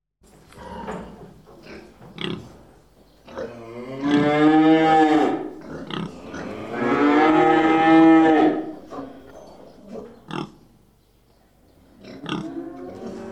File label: Pig And Cows; SOUND EFFECTS1